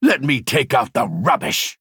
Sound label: attack; high king emeric